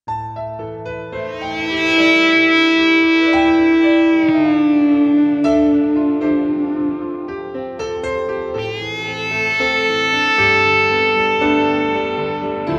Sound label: Volume guitar